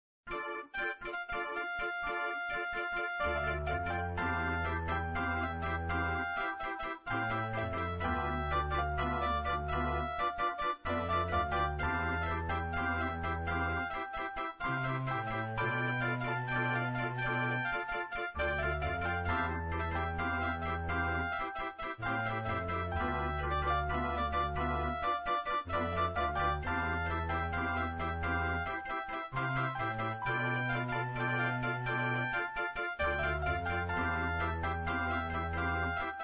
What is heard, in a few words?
alma alto